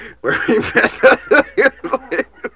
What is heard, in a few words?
LAUGH